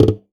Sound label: Classical Guitar Extended Technique